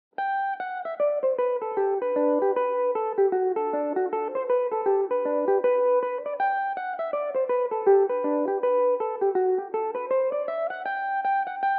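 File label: reel G major and D major, Irish mandolin; reel de montreal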